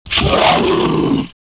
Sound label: rocket fire; xash3d fwgs; halfsecret